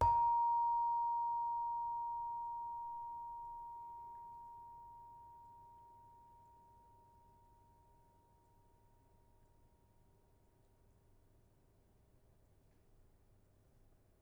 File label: Saron soft, gamelan; gravityharps; timbrebox